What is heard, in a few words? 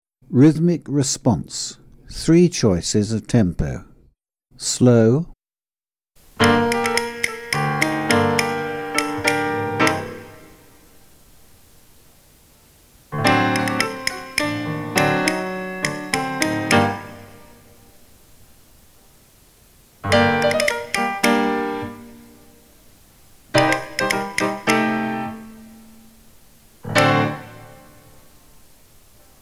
Rhythmic response slow, tap